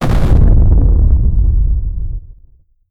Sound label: occult ritual collapse